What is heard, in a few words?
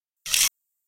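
Mechanism Fast Metal, servos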